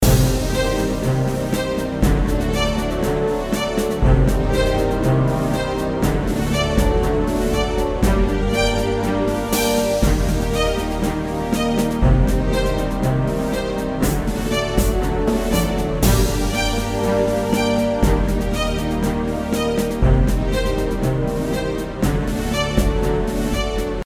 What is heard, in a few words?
RPG Battle Climax; altariaonline